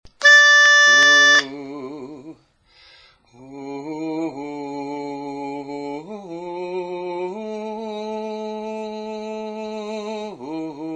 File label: Bass; learning tracks